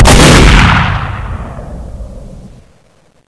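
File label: double barrel, weapons; svencoop; echoes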